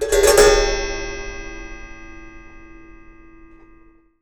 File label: Raw, Wire & Tin, contact mic; musicradar; contact mic madness